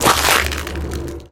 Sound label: glass, footsteps